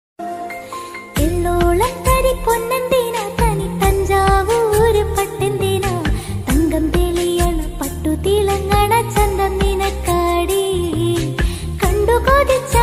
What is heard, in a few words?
malayalam album song ringtone